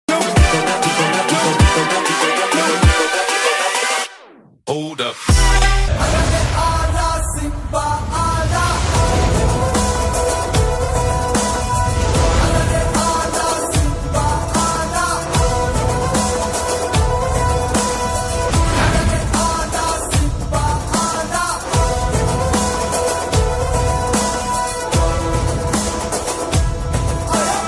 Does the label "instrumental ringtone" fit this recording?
Yes